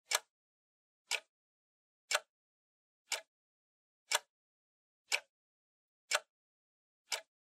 Clock Second Hand Dry Loop

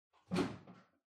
Washer Front Load Far Filter Upper Remove Strong

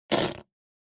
Poo Poo Cushion

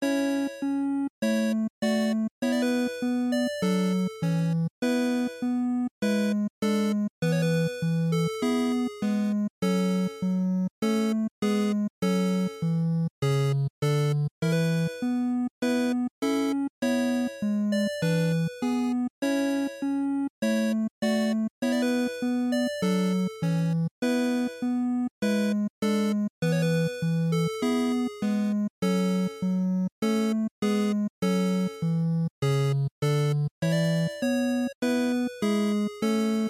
NES RPG Town Loop100

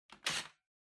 Vacuum Cleaner Handle Remove

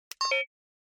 Camera Compact (Button)

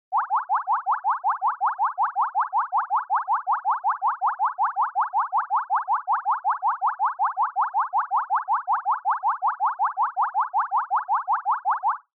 Onoma Panic Slow

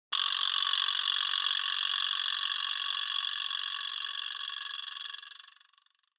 Vibraslap Long

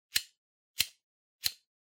Lighter Flick

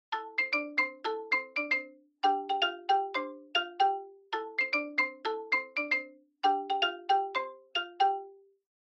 Smartphone Ringtone Speaker

